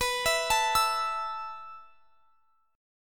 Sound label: B 7th Suspended 4th B down; B7sus4; arpeggiated